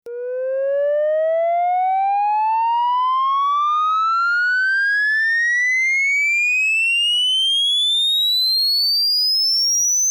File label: Ring Mod, modular